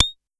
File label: Pollard Perc, Syndrum